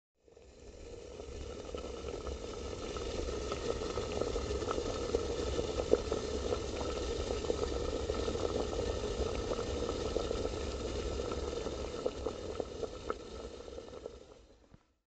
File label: BOILING WATER